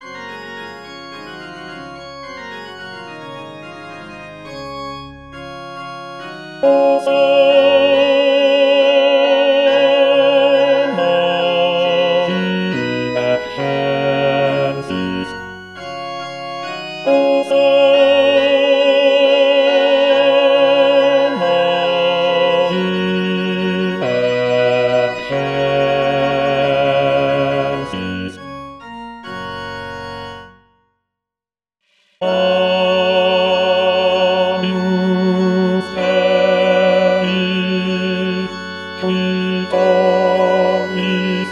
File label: bass